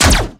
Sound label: shoot laser gun; talking tom gold run gamerip 2016